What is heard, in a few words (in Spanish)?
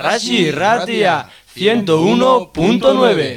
jingle reemisiones; soma